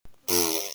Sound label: fart mouth